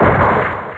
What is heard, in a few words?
Gun